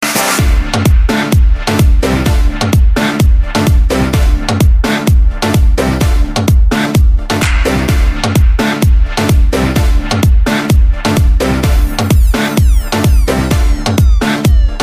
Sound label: Cool Music